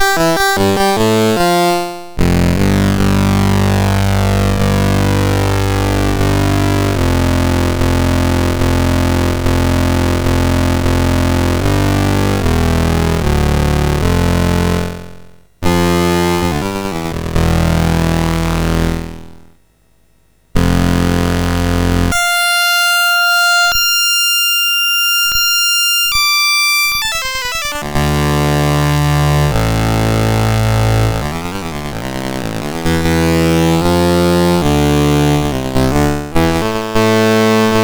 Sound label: Suspense, MOS6581R4